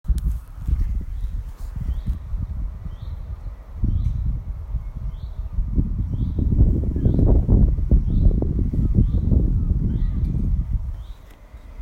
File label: Bird